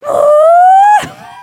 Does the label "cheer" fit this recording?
Yes